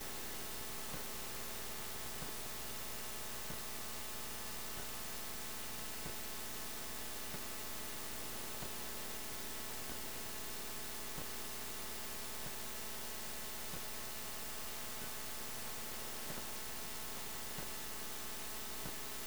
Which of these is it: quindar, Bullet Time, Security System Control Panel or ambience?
Security System Control Panel